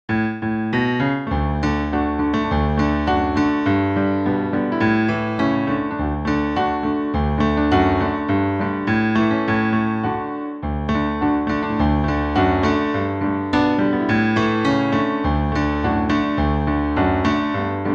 Polka Piano

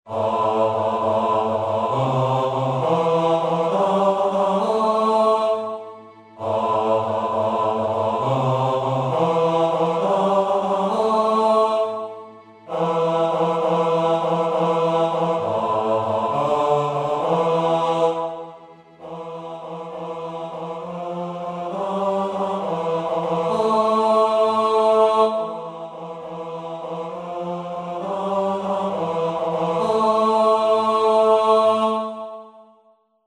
Panxolina Bass

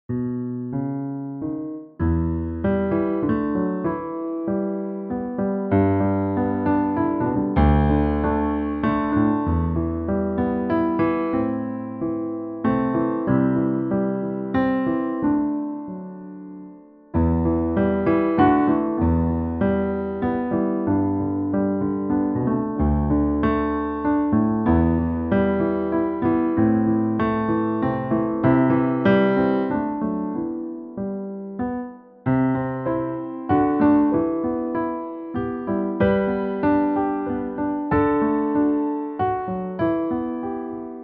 Untitled Air Piano

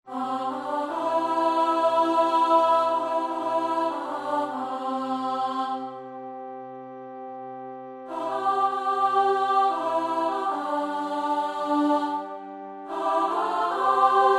Verse + Chorus All

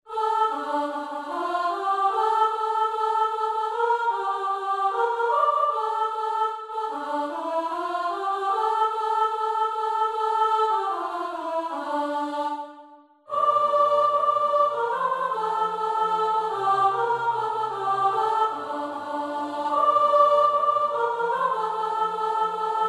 Wellerman Song Sops

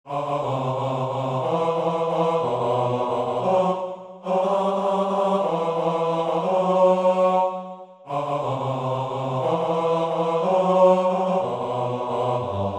Scum of the Earth Bar 502 Basses

